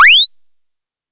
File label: SPACE DRUM